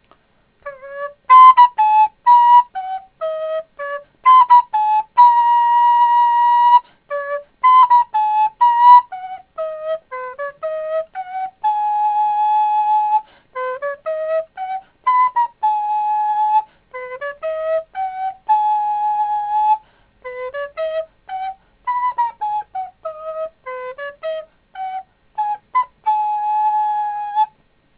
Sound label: tune; skye